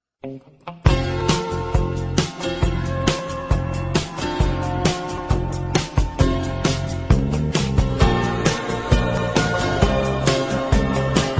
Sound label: ringtone